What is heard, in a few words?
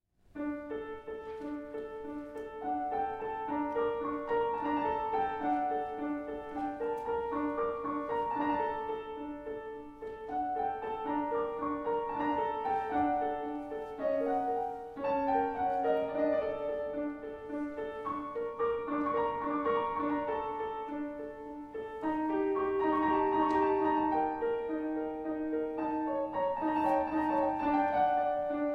Mystic Flute; Hovhaness; Op 22